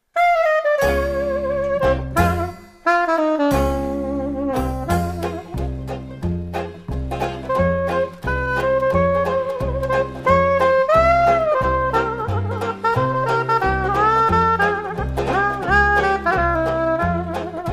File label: Retro Jazz Band